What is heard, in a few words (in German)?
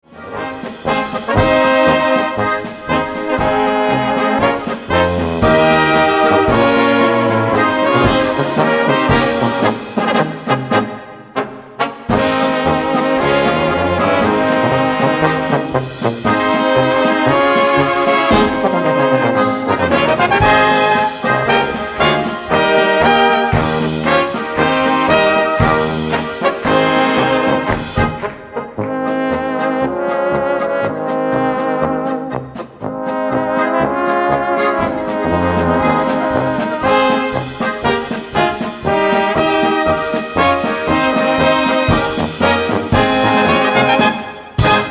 Polka